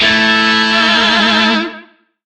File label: Shawzin Chromatic Chord J; Music; DE; Warframe; Lizzie Shawzin